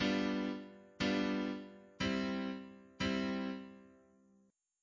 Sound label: chord 4 + r4